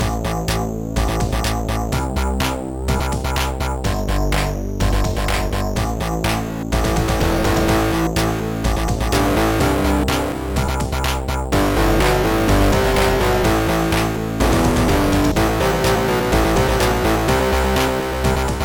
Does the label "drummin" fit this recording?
Yes